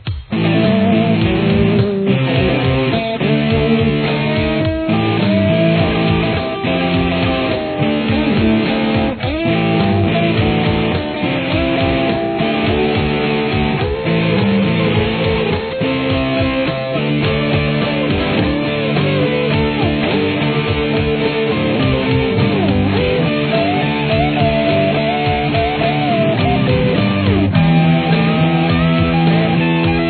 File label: solo